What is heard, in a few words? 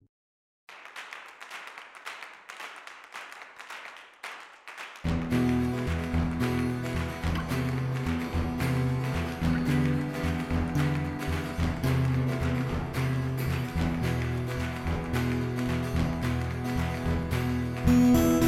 Laughter Song; Octavia; Everfree Radio